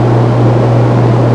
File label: fan mach, amb machine; environment